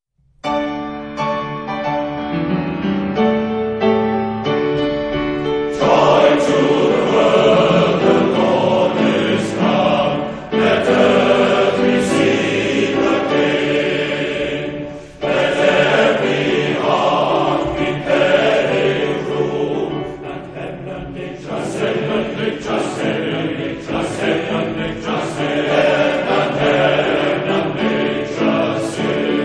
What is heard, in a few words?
Male Voice Choir; Cwmbach; Joy to the World